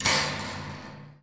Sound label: Tinkle Bling, Cinematic Metal Impacts